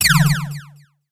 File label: science fiction laser gun or beam fire